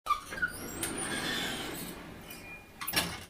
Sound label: garage Door Opening SOUND Effect